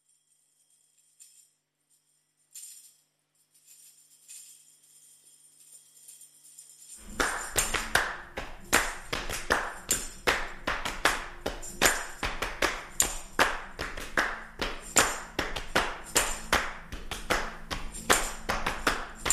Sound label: Percussion Mix